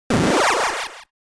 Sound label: Opening, Title, & Start Sound Effect Collection; Shatterhand